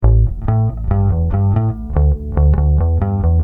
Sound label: bass